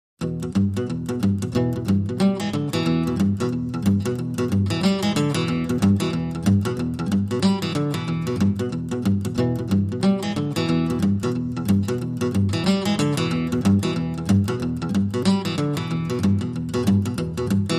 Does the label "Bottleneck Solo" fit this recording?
Yes